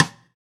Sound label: Rim